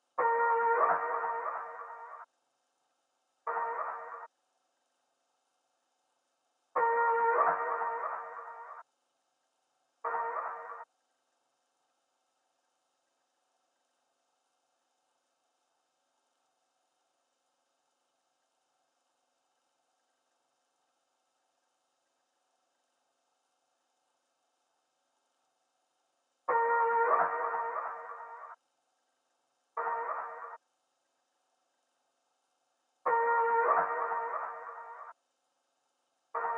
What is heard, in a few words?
146 BPM D# Min Vocals; Trap Melody Loops; Loop Stems; Vocals 2; Cymatics; Powers